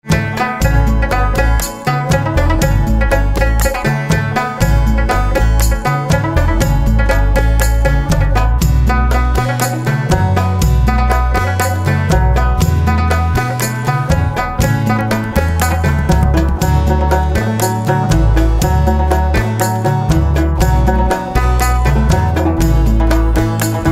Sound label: Rabab, instrumental ringtones